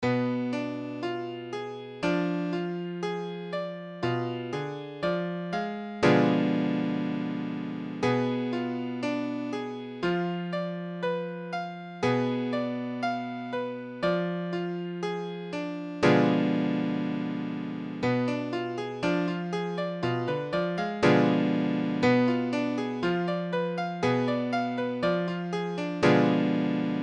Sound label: Bdim7, piano